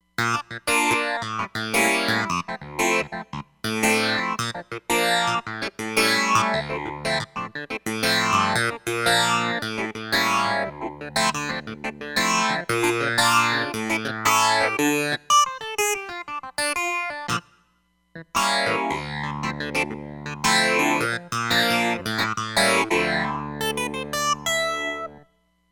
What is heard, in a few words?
Phase Clavi, Roland Fantom XR